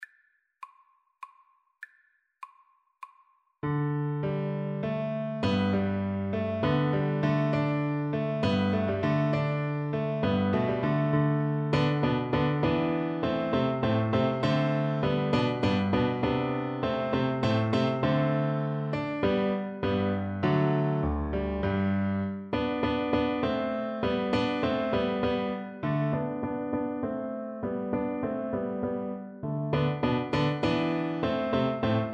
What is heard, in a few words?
water music, Recorder